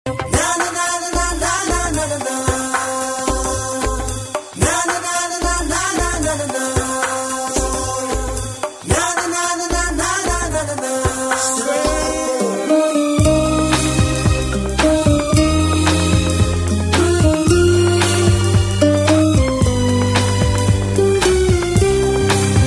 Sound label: guitar